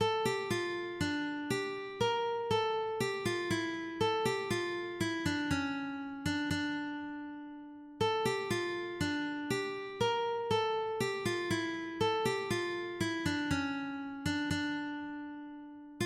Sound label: krieger bourrée, ukulele